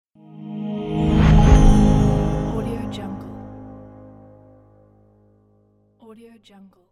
logo effect news

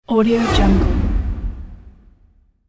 space whoosh with pan; sound ideas

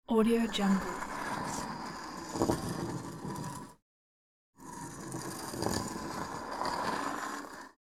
dragging rock on concrete

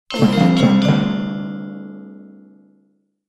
ominous drums stage complete

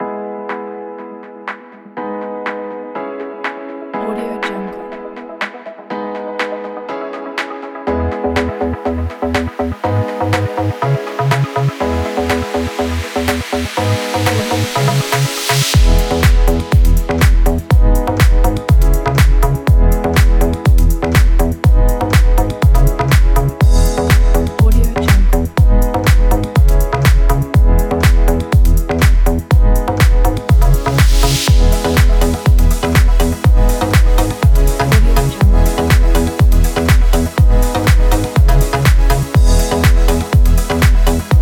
for deep house

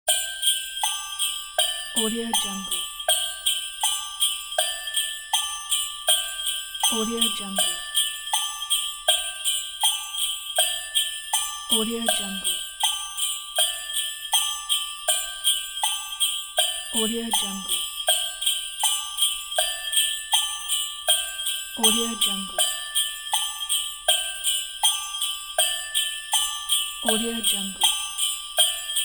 christmas jingle glockenspiel bed

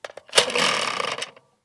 mechanism wind rev; soundmorph

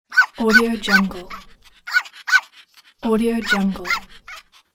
dog bark small

